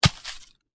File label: Apple lands on leaves on the ground and bounces slightly; Miscellaneous Impact